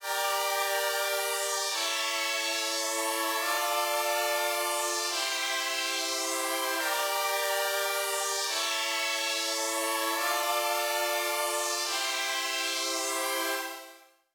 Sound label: PWM Kit 142BPM Verse - PWM Pad DRY, Gmin; Vengeance - Essential Clubsounds Vol.5; Melody & Synth